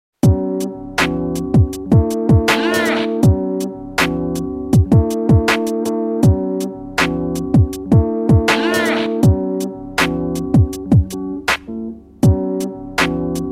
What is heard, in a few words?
Instrumental